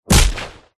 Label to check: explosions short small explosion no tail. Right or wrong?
Right